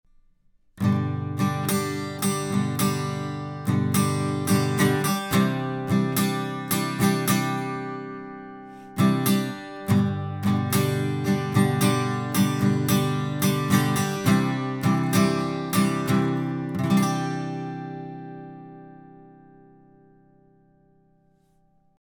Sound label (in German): Telefunken TF39 Lewitt Gitarre